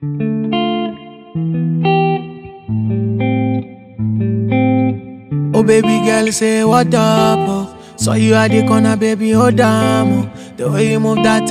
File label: acoustic